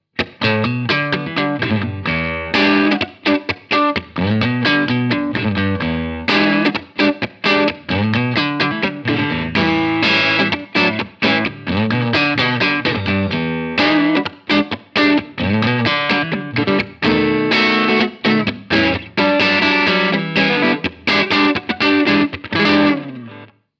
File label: Tone King Gremlin Tweed Clean; 70th Anniversary